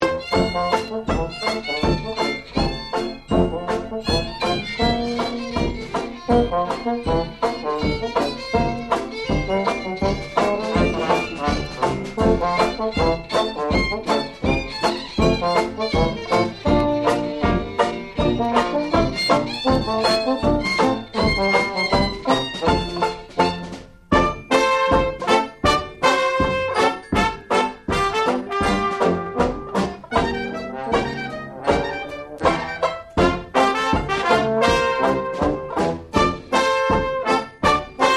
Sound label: calliope rag